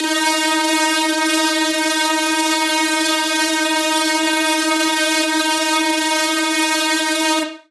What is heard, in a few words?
D-4 Synth